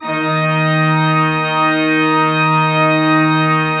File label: D3, Cathedral Organ, PED VOL WERK; Propeller Island